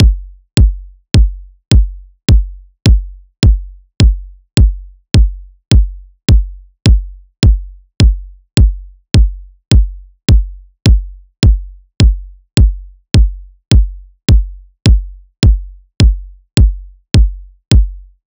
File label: kick 105, Kick Loops; Drum Sample Pack; SD SEISMIC; Slate